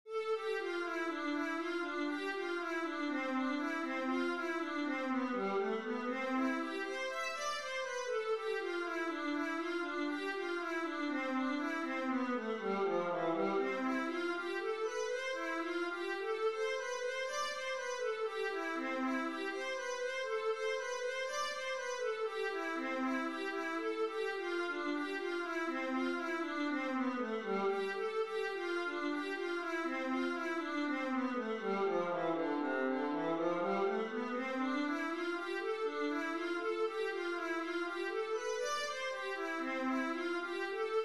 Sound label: vla etude, viola